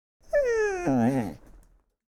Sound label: bad roll; Dice World